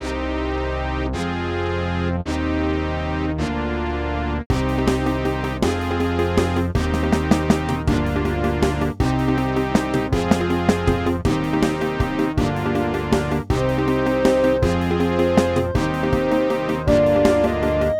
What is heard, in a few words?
Music Pirate; Dreamball